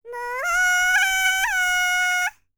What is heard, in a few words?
BULGARIAN, World Voices, BALKAN